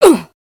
melee minifig grunt; foley

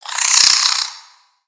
Spider Angry, creatures mechanical; Lego

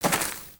Footstep; Lego; Strombie; creatures organic

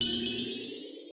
UI heart in bar; aronwk collection; ndaudio

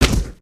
Pets Doberman On Hit; creatures pets

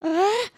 Charge Grunt; emotes

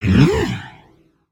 idle, creatures organic

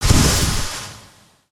Geiser Burst Small

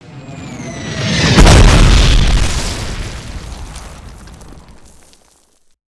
mortar incoming; avant gardens

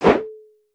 achievement reward flip; aronwk collection; ndaudio

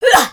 melee minifig grunt; aronwk collection; ndaudio

